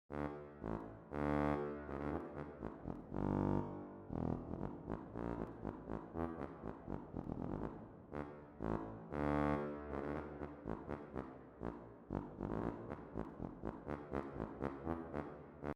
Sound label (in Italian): basso